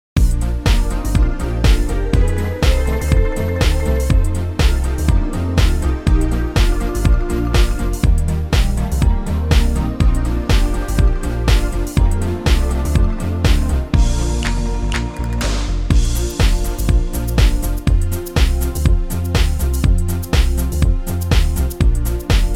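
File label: disco Bb, backing track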